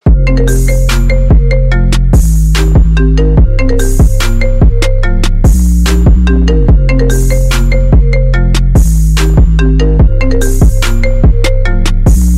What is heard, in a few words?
trap remix; iphone